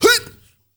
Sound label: MALE RAV, MALE NOISE, Heart of Africa